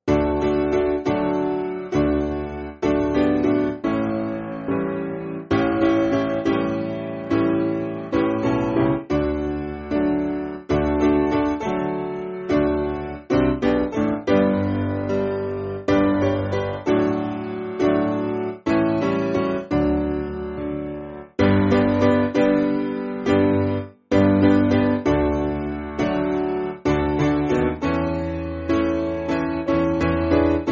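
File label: Piano, Music Backing Files for Churches; Up Calvarys Mountain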